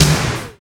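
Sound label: SNARE, right; East West - Phat & Phunky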